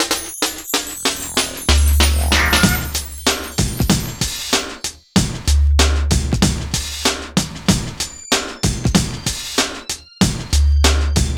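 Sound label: LOOP, right; East West - Phat & Phunky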